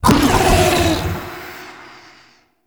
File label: Boreal Paralyzing Screech Loop End; Warframe; The New War; Archon; Abilities